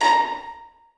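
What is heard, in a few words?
1st violins pizzicato a#5; Sonatina Symphonic Orchestra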